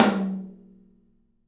TOM, Studio Toms; 3D Percussives

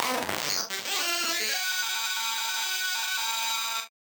Casio, Glitched, malfunctioning synth

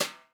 brush; Northstar - New Gold VOL-2; 50S BRUSH

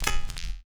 SFX, 170 BPM, Drum & Bass; Ueberschall; Drum & Bass Flash Trackz

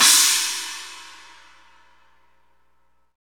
cymbal china, Roland; Northstar; Drumscapes Roland; Cymbals 2

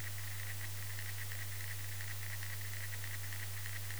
Misc Noise; layering

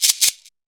SHAKER, Afro Shakers, Shake&Rattle

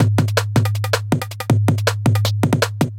Classic Beat, Drum N Bass; Sonic Foundry (Sony Creative Software); Knutrix Drum & Bass

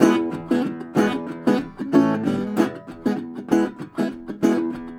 Blues Guitar, RIFF

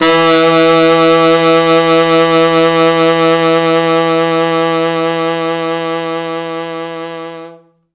T Sax, e2

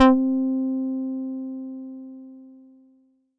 Fatboy Tight c5, Korg Poly-800

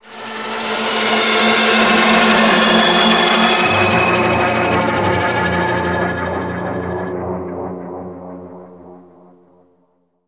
The Birds, Weirdos; Zero-G; Total Drum Bass

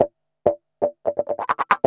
GUI, LICKS; 130 LICKS

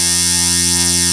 RAVE BEEP; Best Service Alpha Dance vol.2

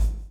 BLOW; Spectrasonics - Backbeat

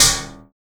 XTRA PERC

